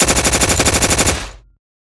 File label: Assault Rifle; SoundsCrate